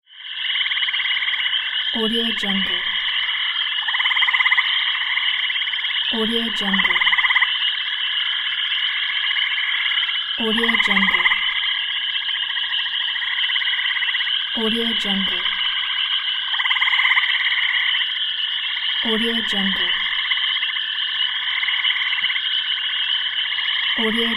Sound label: frogs